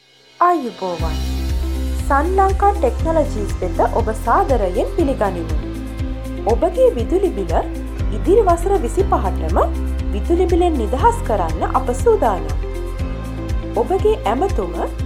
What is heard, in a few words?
ring tone